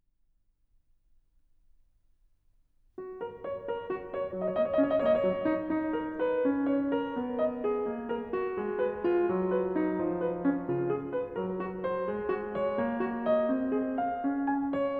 Präludium Fis-Dur; BWV 858; J.S. Bach; Das Wohltemperierte Klavier Book 1

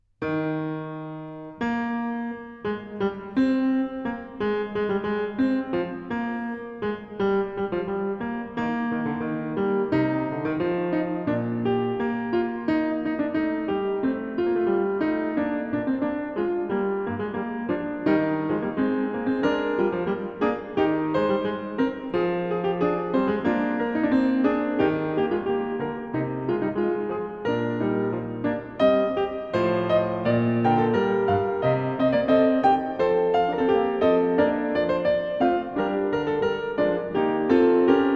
Fuge Es-Dur; BWV 876; J.S. Bach; Das Wohltemperierte Klavier Book 2

